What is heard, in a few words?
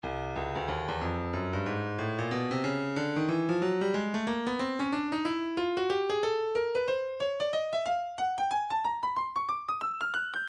breakbeat 092 bpm; Stylus